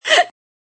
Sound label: Female Gasp, A female gasps; Gasping